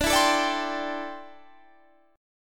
D 7th Suspended 2nd Suspended 4th D, Guitar Standard; 22,19,19,19,20,20; D7sus2sus4 strummed; capo=3